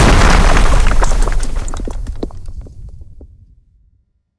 Explosion hit stone; Hovl Studio; SFX Battle sounds; WhiteMan Unity2D

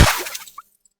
Bullet hit water; Hovl Studio; SFX Battle sounds; WhiteMan Unity2D

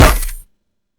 Bullet hit tire; Hovl Studio; SFX Battle sounds; WhiteMan Unity2D

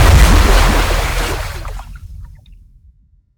Explosion hit water; Hovl Studio; SFX Battle sounds; WhiteMan Unity2D

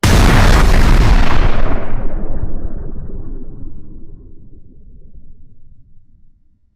Huge explosion; Hovl Studio; SFX Battle sounds; WhiteMan Unity2D

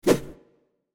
Swing Sword; SFX; WhiteMan Unity2D

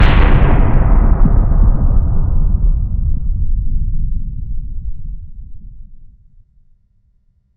Huge explosion in distance; Hovl Studio; SFX Battle sounds; WhiteMan Unity2D

